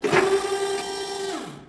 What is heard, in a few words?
Weapon Load; Defiance